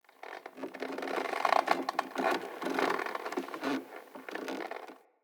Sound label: Tent Rope Creak, Interior; Soul Plane